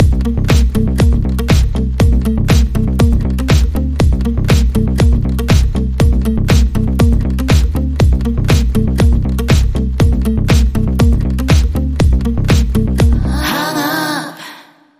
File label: Less Guitar